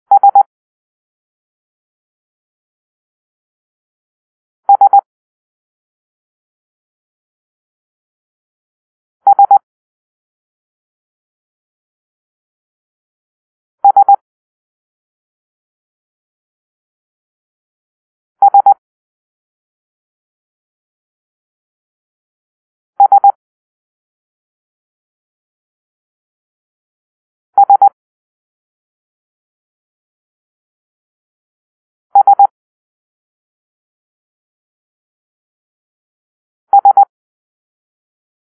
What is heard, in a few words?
Sierra (S), morsecode